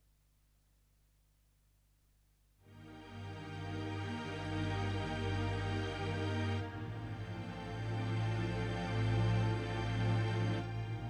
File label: Hall Strings